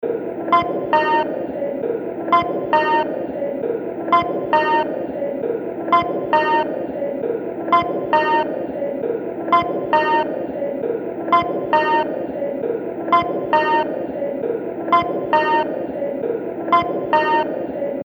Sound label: Groove